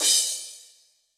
Crash B, Cymbal; Yamaha DTXpress-II

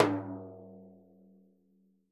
TIMB LO RIM, Synclavier Percussion; ILIO; Synclavier Percussion 2; World & Orchestral

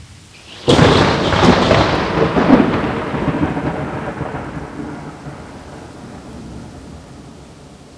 THUNDER; AKAI S-Series CD-ROM Sound Library VOL-6; WHEATHER

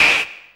FX Dance

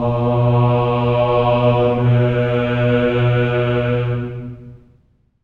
amen; Spectrasonics; Symphony of Voices; GR AMENS

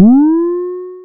SPACE DRUM; Rubber Chicken Software Sample Bank; DRUMS + PERC

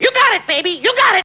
VOX; Zero G; Man Machine

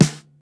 snare; BASEMENT DRUMS VOL 2

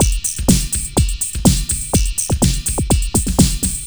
LOOP 124; Sounds Good - Eurotech

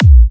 Kicks A; markus hakala

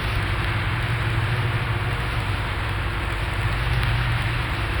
Cracklature, sparse soundscape

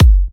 F# kicks; markus hakala kicks

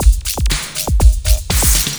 LOOP120; Sounds Good - Eurotech

